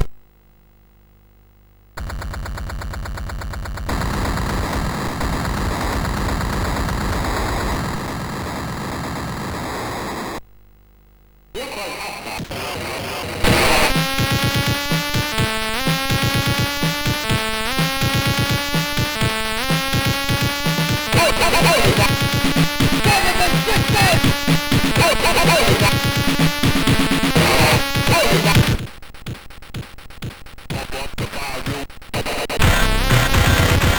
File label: Moving In, MOS6581R4